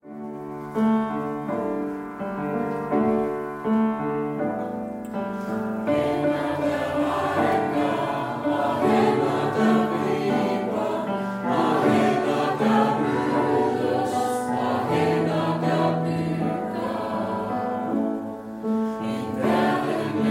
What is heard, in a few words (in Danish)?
sopran bas